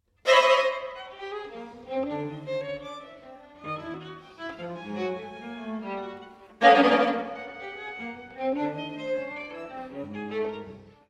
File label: string quartet prestissimo